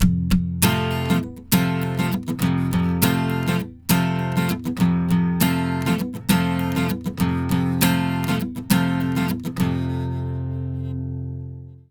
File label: 100 MAJ 2 CHD F, Acoustic Guitar Loops; Electric & Acoustic Guitar Loops; CHORDS; USB Soundscan vol.04; AKAI